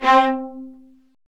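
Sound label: Marcato, Strings; Vlas Marcato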